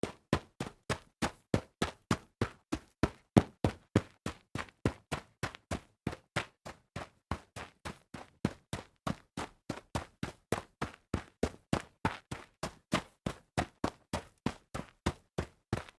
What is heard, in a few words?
running on dirt